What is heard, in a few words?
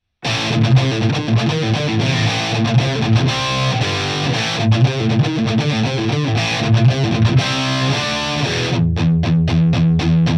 tone marshall hard